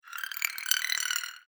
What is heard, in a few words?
Creepy Horror Sound Bite; Evil Sound Effects